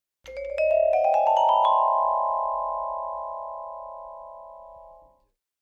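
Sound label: Vibraphone Ascending; mallets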